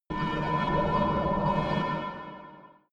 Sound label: space machines working hall